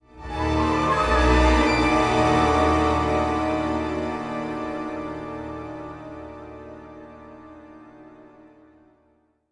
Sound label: sighting, music flourish